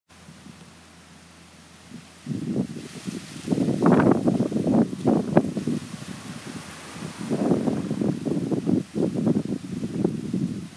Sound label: wind blowing